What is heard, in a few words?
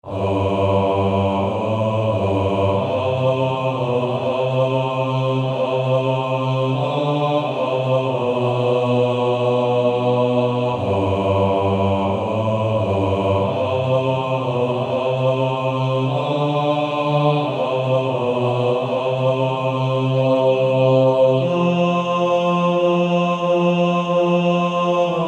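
Male Choir, bass 2; More Love to Thee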